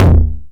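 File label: 909 mid tom tape saturation; Toms; Goldbaby Tape808 & Tape909; The Tape 909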